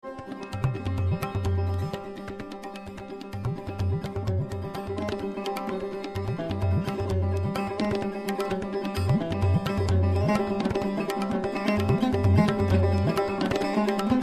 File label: Basant